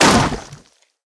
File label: Warmonger Balloon Tar bomb